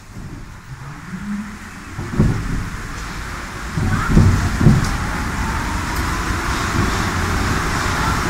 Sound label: start, metro